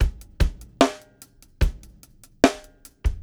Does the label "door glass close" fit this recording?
No